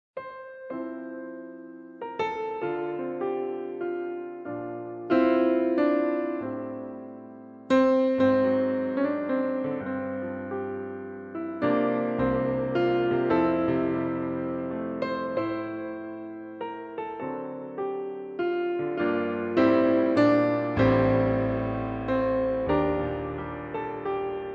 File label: holiday, Away in a Manger